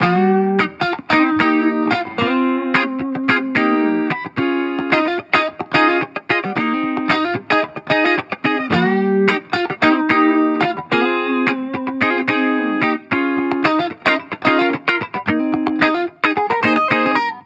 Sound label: Guitar Bpm110 Key G# Full, 70s Guitars Full Mixes; Fox Samples; Back to the 70s Guitars